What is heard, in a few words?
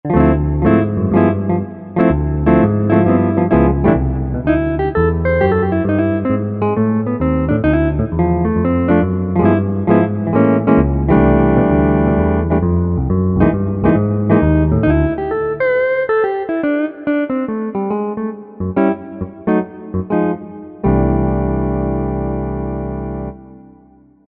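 Jazz Guitar; Sonic Reality Modern Songwriter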